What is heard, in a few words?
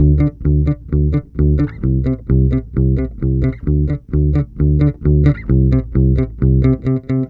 BASSE, Roots Disco; USB Soundscan vol.32; AKAI